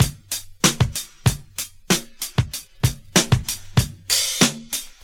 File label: 95 bpm breakbeat f key, Free drum beat - kick tuned to the F note. Loudest frequency: 3675Hz